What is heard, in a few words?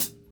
focused high hat B key; hat samples